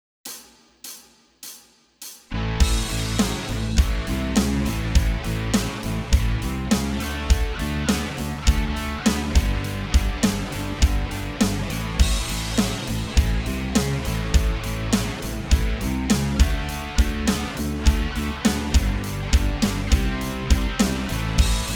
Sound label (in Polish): solo podklad